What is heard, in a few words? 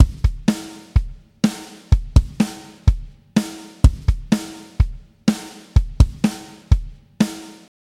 Studio Drum Basic